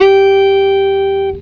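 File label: single note, guitar; PlatinumPhattCD2; E-MU Producer Series Vol. 8 – Platinum Phatt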